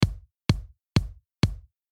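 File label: 140 explosion kick dry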